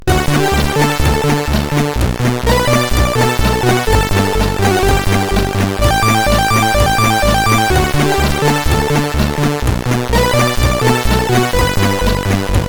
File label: Cat Rap, CSG8580R5